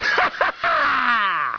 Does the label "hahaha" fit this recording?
Yes